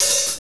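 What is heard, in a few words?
hi-hat, Techno Rock; Northstar; Drumscapes Roland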